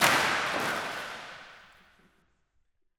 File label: Shield breaking in large room; SFX; IRL Recorded